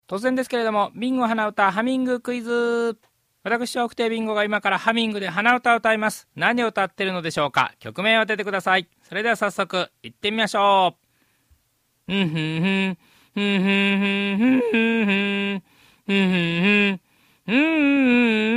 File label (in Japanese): hanauta